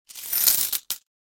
coins in hand

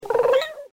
cat meow purr

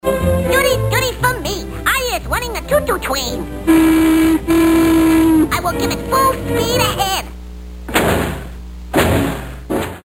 tweety choo choo train

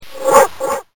bark

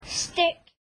stick

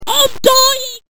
dying

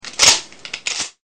shotgun

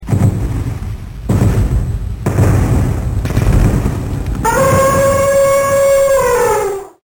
elephant stomp

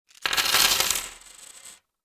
coins to table

